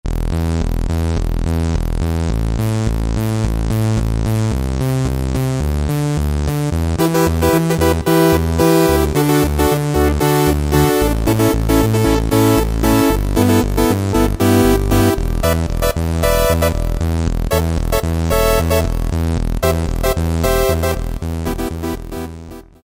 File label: Brass Hot Synth Brass, Yamaha EX5R; Factory presets